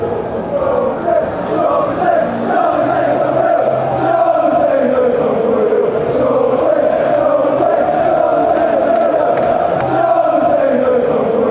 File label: shall we sing song you, fanchants; lokeren